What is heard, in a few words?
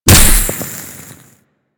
Generic Ability Impact; Warframe; Ember